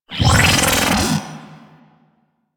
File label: Ability Cast; Warframe; Warframes; Trinity